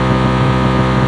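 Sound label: Engines medium loop; SuperBike2001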